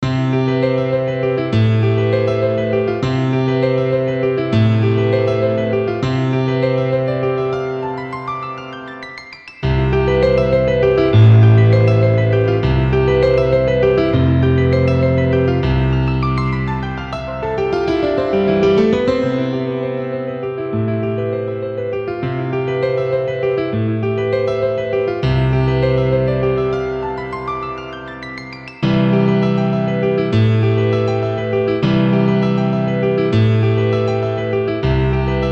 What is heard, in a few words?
piano piece